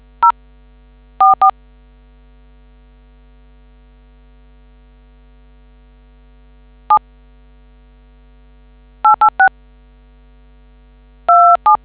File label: dtmf